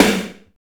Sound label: SNR, snares; Roland - Rhythm Section; Snares 1; Sn Modules 1